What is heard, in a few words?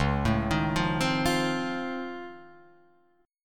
C sharp Augmented Major 9th down Guitar Open F, chord; C#+M9; arpeggiated; JGuitar